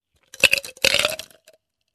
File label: Ice Cubes falling in cup